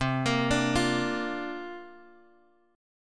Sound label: C 9th Suspended 4th C; C9sus4 Chord {X 3 X 3 3 1} arpeggiated